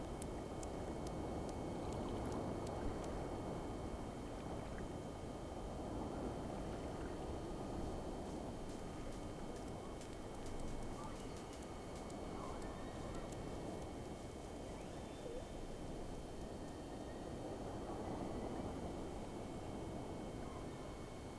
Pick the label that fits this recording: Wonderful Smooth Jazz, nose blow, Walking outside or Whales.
Whales